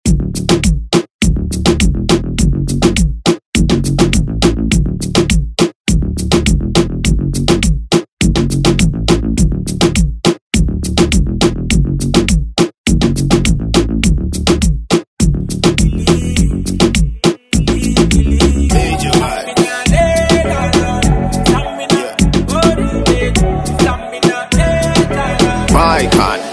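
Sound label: Afro Reggaeton Redrum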